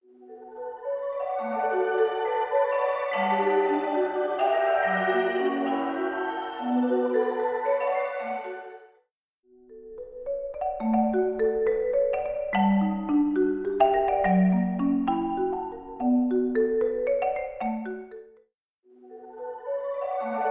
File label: Crystal Oct Chamber; Preset: Crystal + Oct Chamber; Physion Mk II